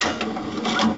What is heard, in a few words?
gacha dispense